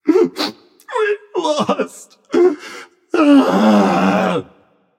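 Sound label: nonverbal boohoo; smite; voicepack; merlin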